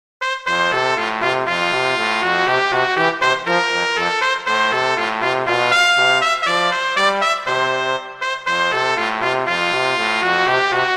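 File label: Duet, horn; Mozart; Duets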